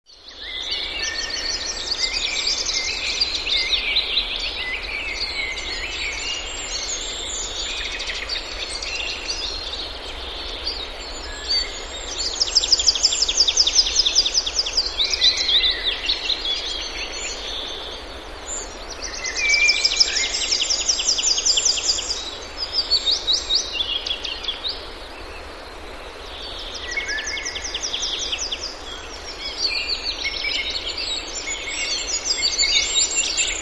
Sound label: Heavy Woodland Birds